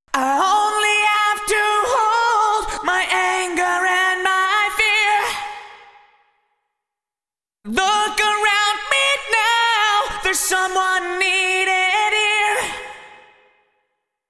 Vocals Yamaha Rev7 Vocal Plate 1.7s PD100ms Vintage Digital